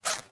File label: Zipper; Fairlight Sound Lib